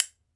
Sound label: B. BONES, Ireland, Old World Instruments; E-MU Producer Series Vol. 7 – Old World Instruments